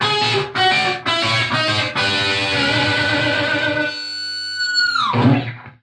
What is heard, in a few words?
straight up guitar lick